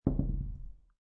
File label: Dice Throw One Die On Felt; Casinos; Lynne Music